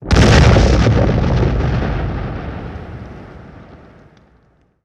Rocky area explosion

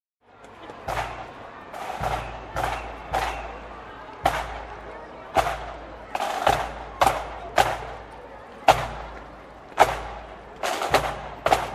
Military parade at public place

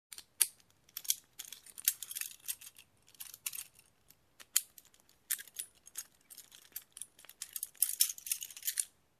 Handcuffs clicking

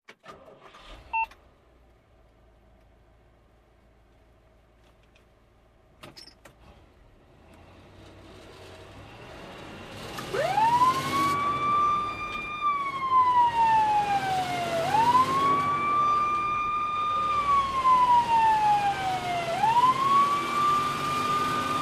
Firetruck cockpit startup

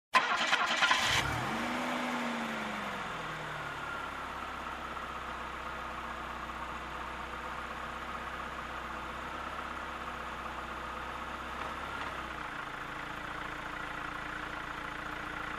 Car tires on dirt road

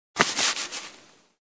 Stacking Boxes of clothes

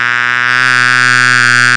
Close-up hornet sound

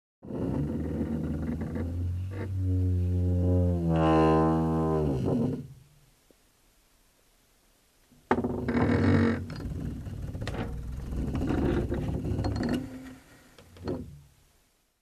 Dull old wooden floor creak

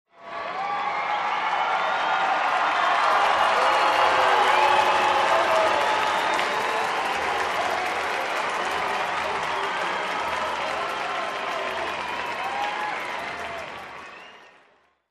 Crowd Cheering and Booing

